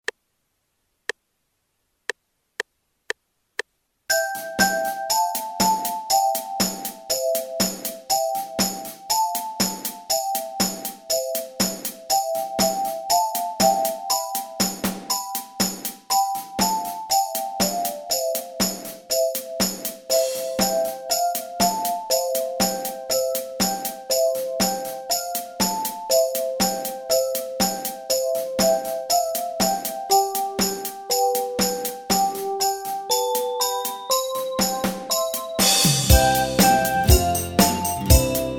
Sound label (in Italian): base senza melodia